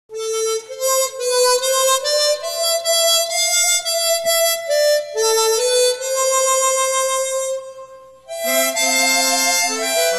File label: Hymns On Chromatic Harmonica; Living For Jesus